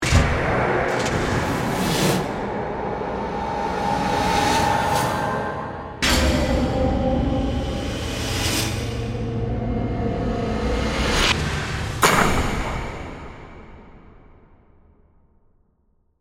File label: Horror Composition, Ghostly, Swells, Haunting